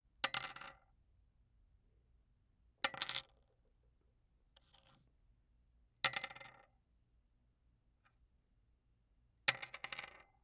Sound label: Bottle Cap Metal Drop on Wood; Foley - Props; Foley Essentials